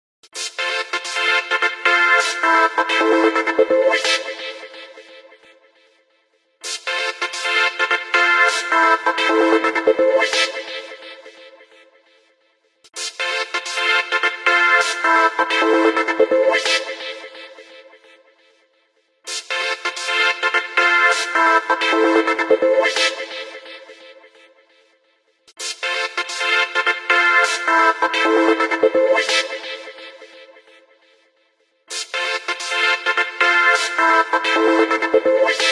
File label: Ringtone Windows Phone